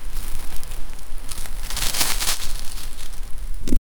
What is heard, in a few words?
leaves crunching underfoo, outdoors